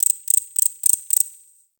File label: Forest Close Insect Chirp; Warframe; Grineer